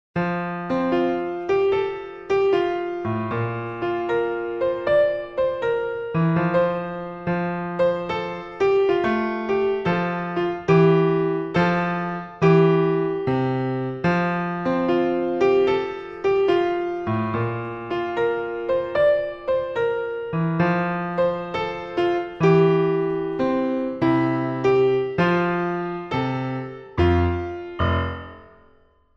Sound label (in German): Kleiner Swing Am Morgen